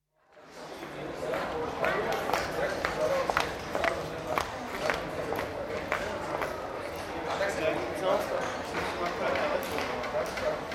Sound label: Pedestrian street, Bratislava, footsteps on cobbles, speech (Slovak), no traffic; BBC